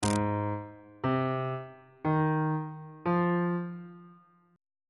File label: a flat major 6th chord intervals